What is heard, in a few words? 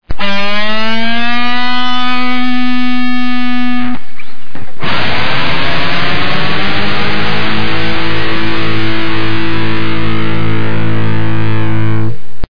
Music Ease In